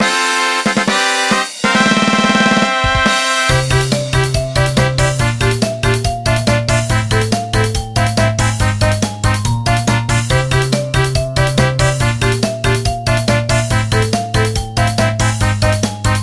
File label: Fanfare